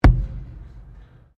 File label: METAL IMPACT THUD; Materials